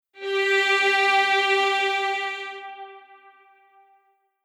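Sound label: Strings Decrescendo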